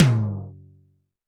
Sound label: TD-20 Tom A, Roland TD-20 Toms